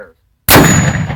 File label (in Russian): vystrel pzf